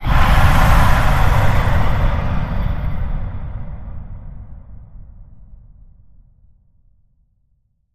Dragon Fire Breath

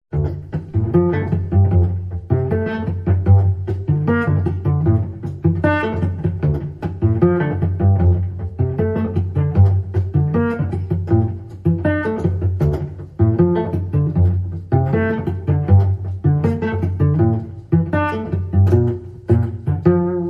Cello Suite 2 Pizzicato Only

